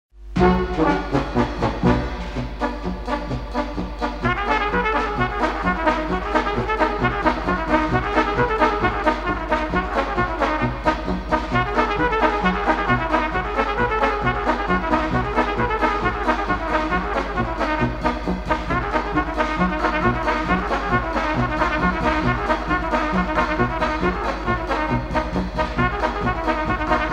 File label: Brass Band - Old Kolo